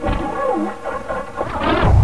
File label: Engine Start; Virtual Wings